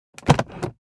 Airplane interior bathroom door close; vehicle

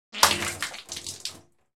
Human spitting spit water into sink

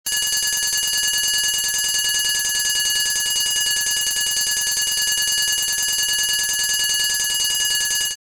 Fire alarm bell ringing steady and short

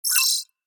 Digital futuristic alert

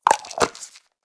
Plastic pill bottle twist cap closing

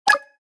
Digital click

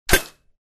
Bullet hit on metal object

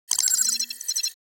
Futuristic data

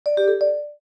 Notification alert

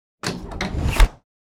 Sci-fi hydraulic door opening or closing

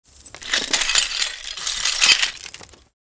Glass shards and debris

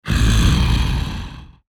Werewolf angry grunt

